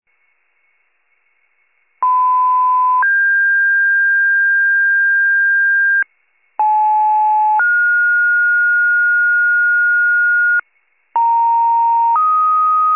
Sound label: FIRE pager alert